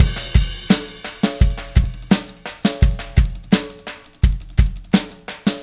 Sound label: Static Beat Crash; Sonic Foundry (Sony Creative Software); Indie Rock Anthems; Session 13 - Static